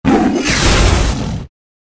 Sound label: Tiger